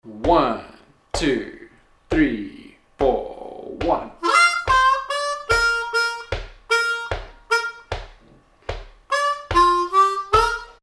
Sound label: Lick, harmonica